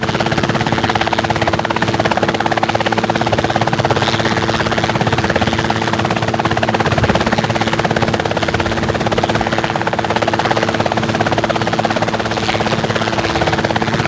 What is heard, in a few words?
apache engine work offload high, tanks; War Thunder